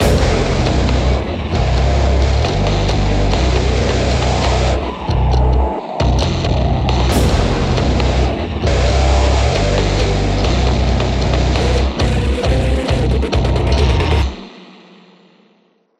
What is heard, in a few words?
Music Song Intro; Hellbound